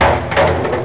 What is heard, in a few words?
Barrels; FX; Percussion Samples; drumkit